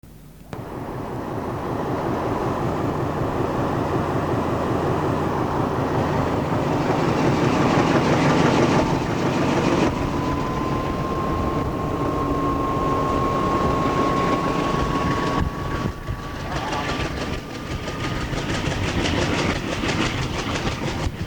A large file, but a terrific action sequence of 4000hp of Romanian; Craiova A-551